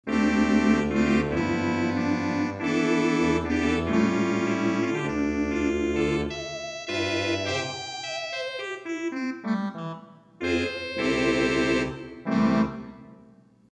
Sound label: 8' Tibia 8' Trumpet, theatre organ